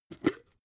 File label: jar glass plastic open cap